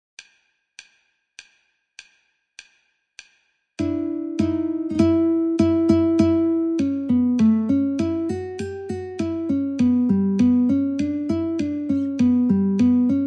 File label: Guitar metronome 50